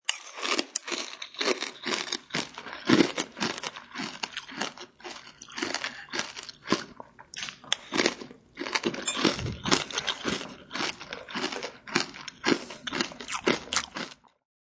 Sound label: Chomping on some cereal and milk; Chowin' Down Cereal; Eating; Sound Effects:Human